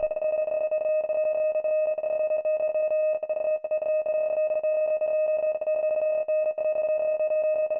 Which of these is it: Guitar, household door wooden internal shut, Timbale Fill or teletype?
teletype